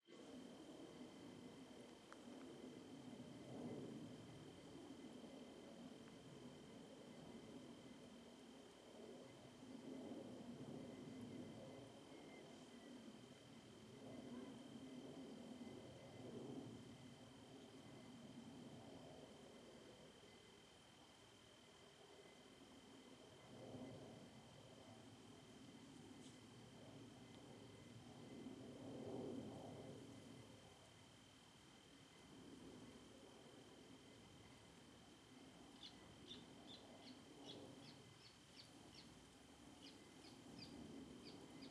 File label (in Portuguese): Ambiencia de Noite em Estrada de Terra; Ambiencia de Noite em Estrada de Terra da Pousada Inacia e Muito Ruido da Rodovia; canais surround 5.1